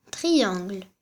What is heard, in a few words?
triangle